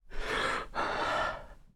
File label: Player UI, sfx; Five Nights At Akers; soma